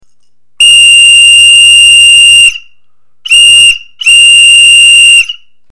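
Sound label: whistle sound of a whistle; звуки свиста человека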